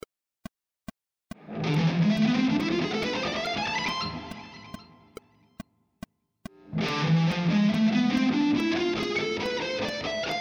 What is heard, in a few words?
phrygian dominant